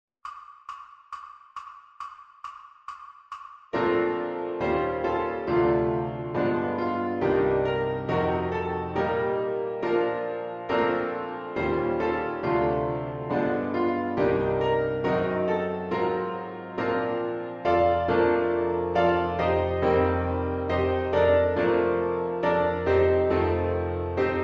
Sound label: Piano Christmas; Ding Dong Merrily on High; ViolinSchool